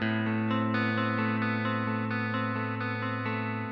OPEN G RINGTONE, GUITAR

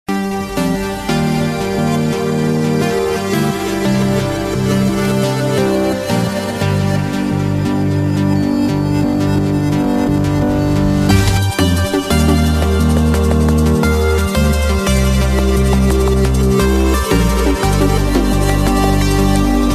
SAMSUNG GALAXY S5 RINGTONE; CYBORG; MOBILE

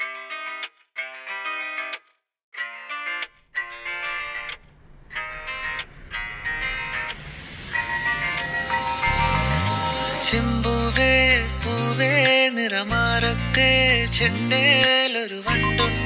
MALAYALAM REMIX RINGTONE

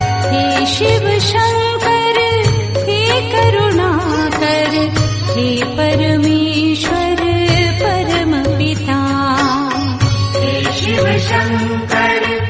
HINDI GOD SONG RINGTONE

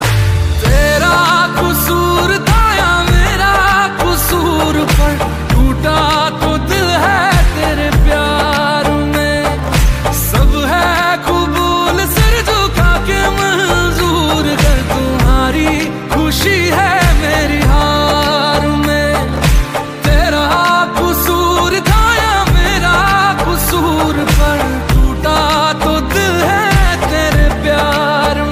HINDI SONG RINGTONE

